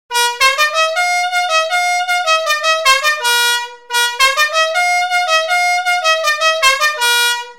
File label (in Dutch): luchthoorn Metal 12V; claxons; tuning